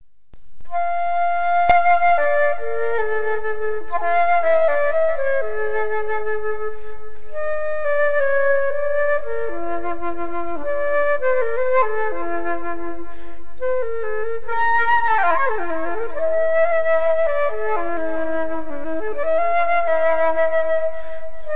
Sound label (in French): Meditation pour flute